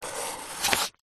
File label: office pencil draw line on paper; zapsplat